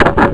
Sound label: Kerklunk; Gravity Balls